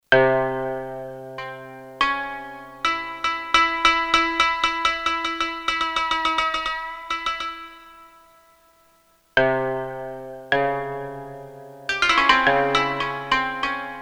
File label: Mix Hit